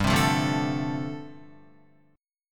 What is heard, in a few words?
G 9th Suspended 4th, Guitar; strummed; G9sus4